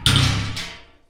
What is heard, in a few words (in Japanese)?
doa simeru; easyrpg; Ib